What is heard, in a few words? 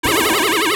Mirror Shield Reflect Fire Start; Zelda; OOT